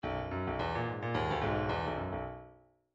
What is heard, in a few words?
midi grooves funk